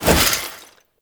Heavy Stomp Start; Abilities; Warframe; Tau; Dax Heavy

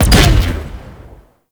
Space Fighter Proj Explosion; Warframe; RailJack; Corpus; Space Fighter Gox Agent

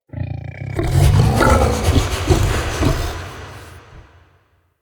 Corrupted Arena Crosser Taunt, Animals; Bromius

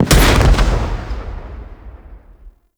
Corinth Prime Alt Fire Explosion C, Heavy Shotgun; Warframe; Tenno

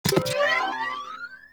Hack UI Positive; Warframe; The New War; Narmer Hack

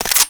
Rifle Mech; Weapons; Warframe; Zariman

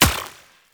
Kinetic Plating Shield Hit; Warframe; Runner

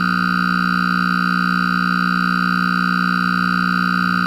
Light Buzz; Warframe

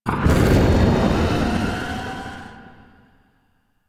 Corruption UI Fracture Open; Warframe; Missions; Zariman

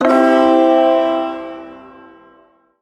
Ersatz Shawzin Phrygian Chord A; Music; Chords; Warframe; Duviri

